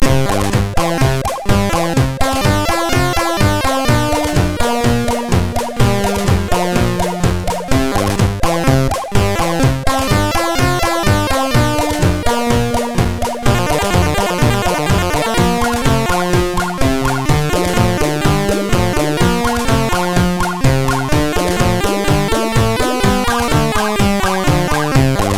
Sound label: sid MOS6581R2; Fiendish Freddys Big Top O Fun